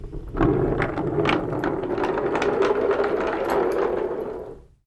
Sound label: Motion of a circular object; افکت صوتی